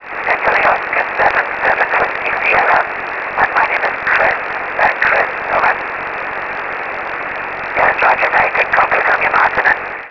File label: 50MHz ssb aurora